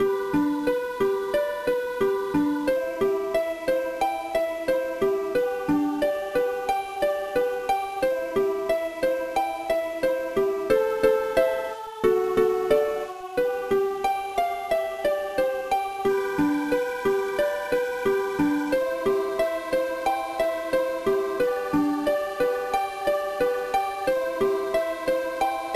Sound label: BGM; Petz Vet; game boy advance